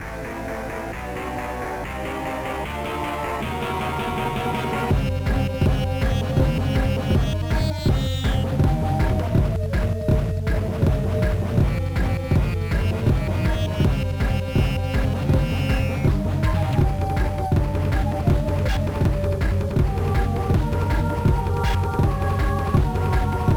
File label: Griffin Battle; prince of persia the sands of time 2003 gba